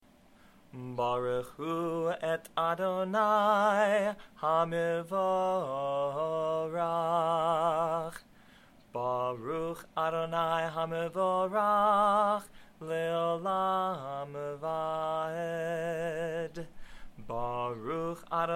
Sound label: Barchu